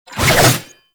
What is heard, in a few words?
Bayonet Melee Charge Up Spin; Warframe; Tenno